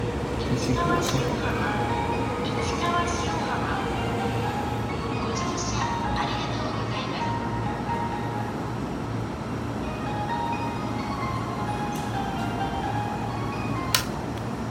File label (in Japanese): 京葉線市川塩浜東, train sounds